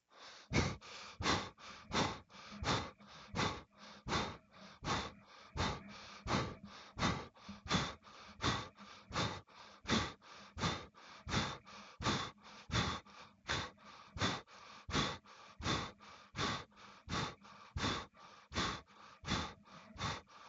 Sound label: jog; GameplaySequenceDemo